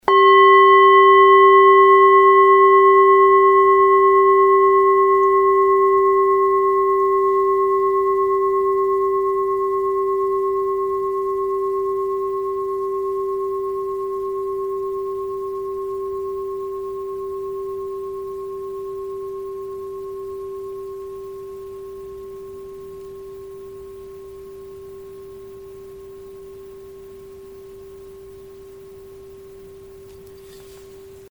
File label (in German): klangschale 500g; boen